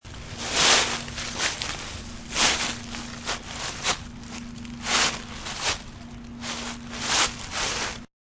Leaf Srch, Searching and rustling through leaves with hands.; Sound Effects:Objects